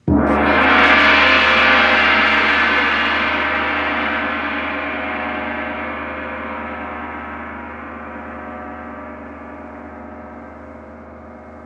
Chinese Gong Large Two Hits

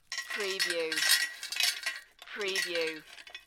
Metal Chain Movements

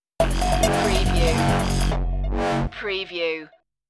inevitable drum stinger

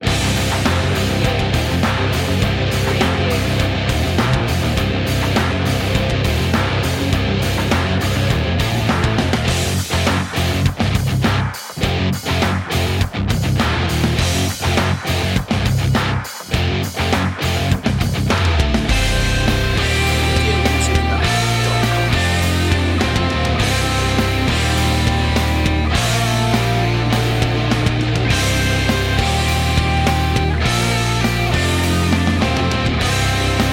dropped riff

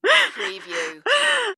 Female Crying Sounds

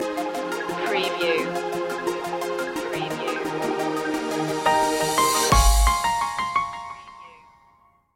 dub glide stinger

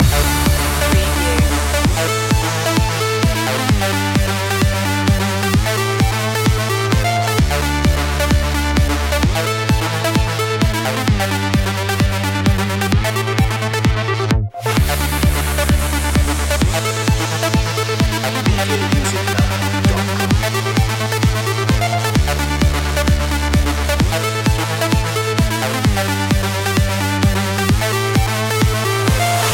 acid funk sandwich loop

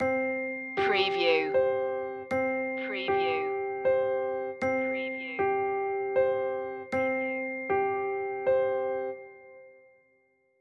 look at the stars stinger

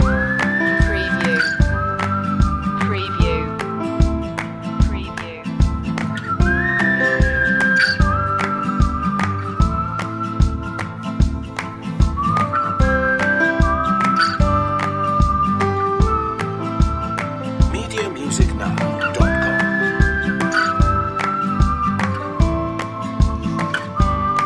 the whistle song loop

